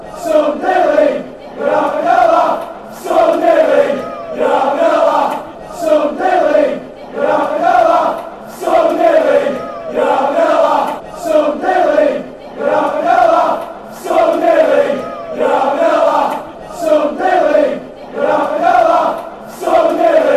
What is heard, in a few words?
yer having a laugh, fanchants; sunday league